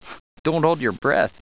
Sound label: breath; otis